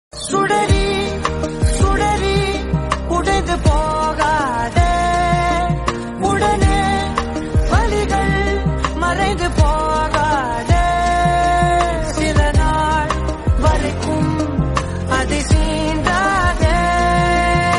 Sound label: Tamil Song